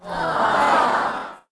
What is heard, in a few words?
Ambient Sound; Pangya; Fantasy Golf